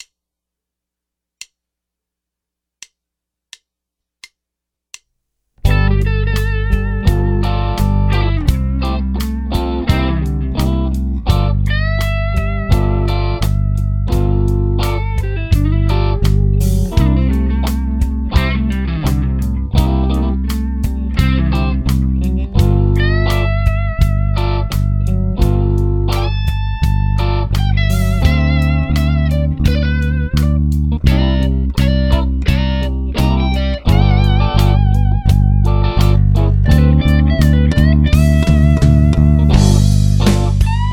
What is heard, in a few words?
Bass Guitar Playthrough 85BPM; Bass Guitar 101